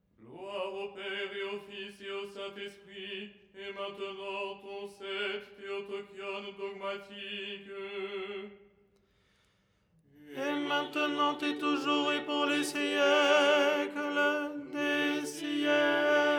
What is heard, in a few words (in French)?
tenor; " Gloire… et maintenant… "; Les 8 tons en français